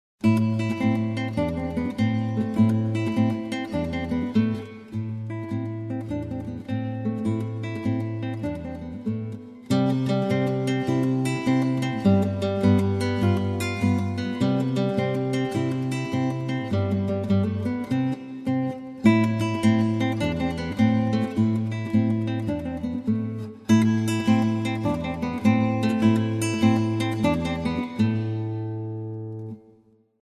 Tarantella, Gitaarboek

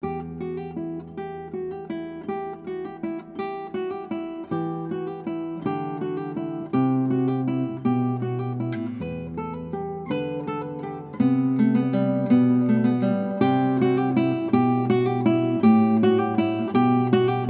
Guitar Recital; Gitaarboek; Carol of the Bells

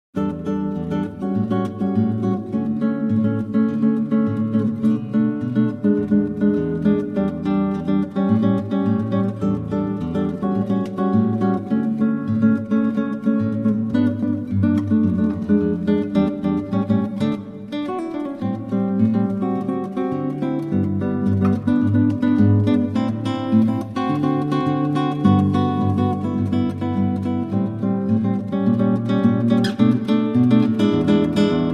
Guitarra; Gitaarboek

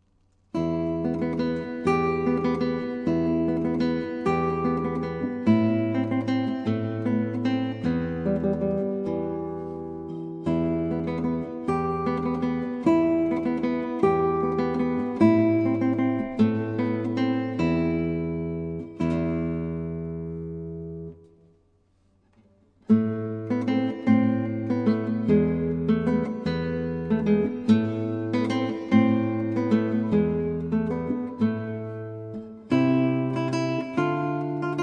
Waltz Jig

